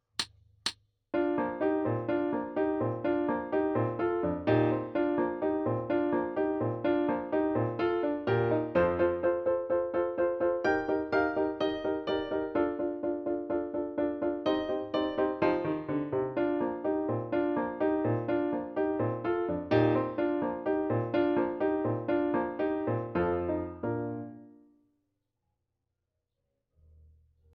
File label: Allegro Full Tempo Accomp Only, Tuba; Kabalevsky; Waltz and Galop